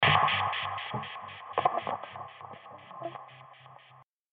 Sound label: C 120 Elec GTR solo chords